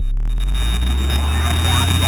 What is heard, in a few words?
Tech Rise; Sci-Fi SFX Pack